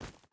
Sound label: footstep barefoot forest run; Rust